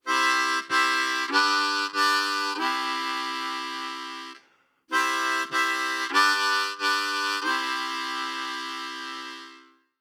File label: Chords In 1st Position ('straight'), Blues Harp